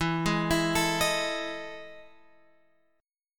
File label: E Major 11th chord